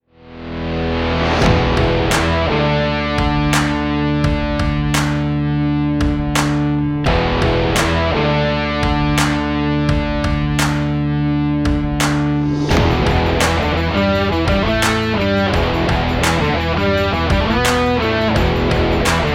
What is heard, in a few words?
Stylish Stomp Sport Rock; This stylish sport rock is perfect for energy action videos with cars, sports and workout videos.